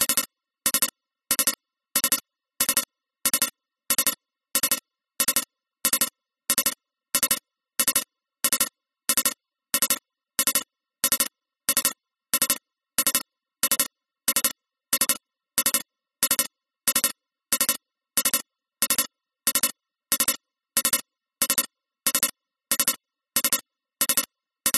science fiction alarm digital tone 3 cluster fast

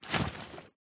Cane Umbrella Large Open Unfold Soft

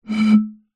bottle beer empty blow air across top

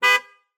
Pontiac G6; Blastwave FX

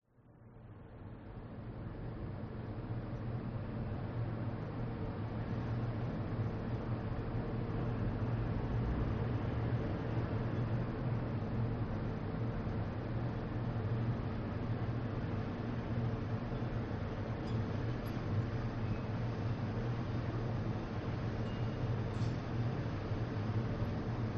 City Ambience With Garbage Truck; west wolf

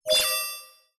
science fiction computer console activation tone; zapsplat

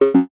multimedia game incorrect buzz tone

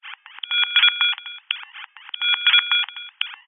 technology cb radio interference burst receiver

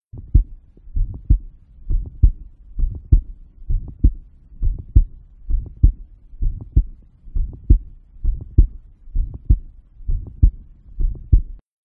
heartbeat heart murmur at mitral

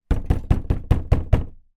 household door internal wooden hollow knock hard

